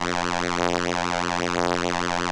ELKA, String Machines; ELKA RHAP

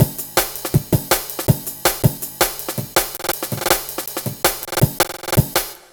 162 Dinky Break; breaks; m8 breaks

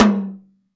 Tom, Yamaha DD-20

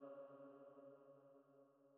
Female Pad C#2; KG MegaSaur

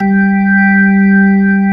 ORG, Organs

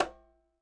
CH cl h, KILLER CONGA

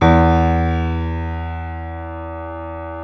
PNO, KEYS; Synclavier Sampler Library

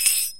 PERC & KALIMBA

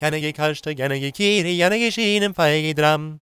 GAELIC, World Voices; ILIO; Vocal Planet

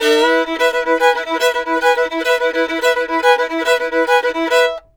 FIDDLE; Sampleheads; Whole Lotta Country 1 & 2

